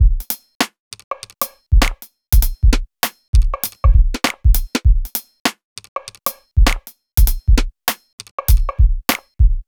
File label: Hip-Hop Drumloop